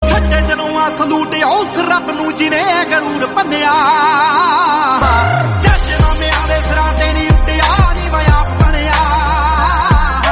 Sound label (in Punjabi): punjabi song